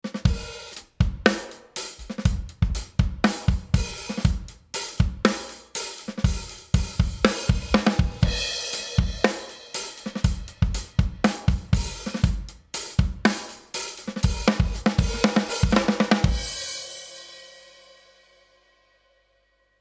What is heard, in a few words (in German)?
Compressor Drums Ratio 4 zu 1; SSL G Series